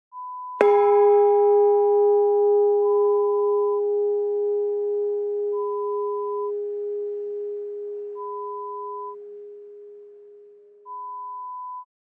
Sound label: Bell